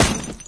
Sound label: player ladder; Source Engine; dod